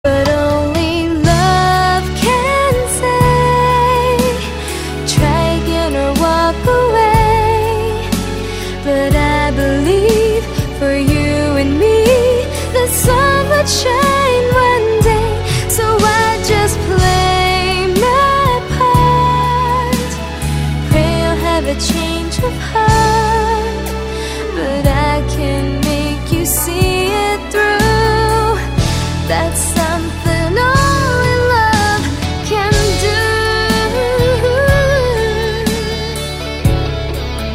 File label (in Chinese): nv sheng ban